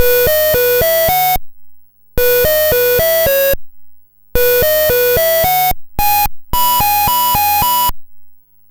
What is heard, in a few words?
Apple II Synth; 8-Bit; Chiptune; Sonic Foundry